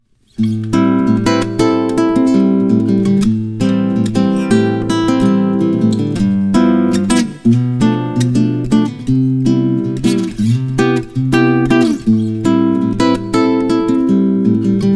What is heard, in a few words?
Samba